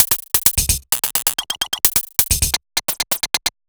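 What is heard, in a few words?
Odd Glitch, 130 BPM, Percussion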